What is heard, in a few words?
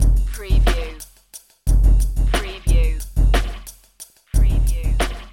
Hip Hop Drum Loop 90 BPM - Straight Without Vinyl